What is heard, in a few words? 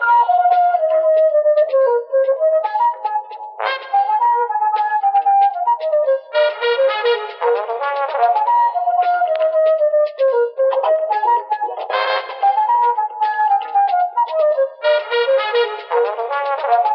flute horns; loops; Phonky